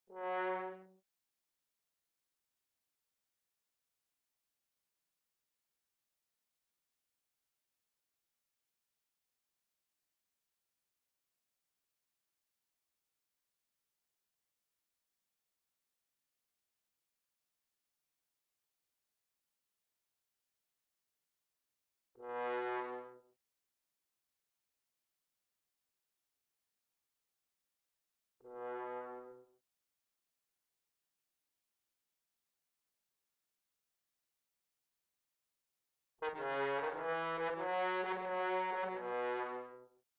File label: Bass Trombone